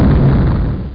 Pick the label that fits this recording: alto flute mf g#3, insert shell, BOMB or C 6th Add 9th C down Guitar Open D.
BOMB